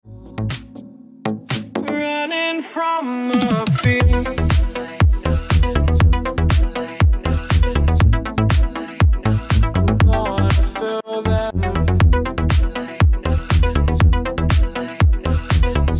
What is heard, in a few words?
Remix Ringtone